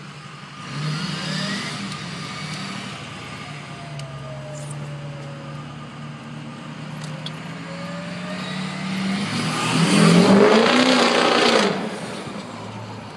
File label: 60 powerstroke